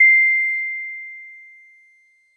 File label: DreamLike DX100 C6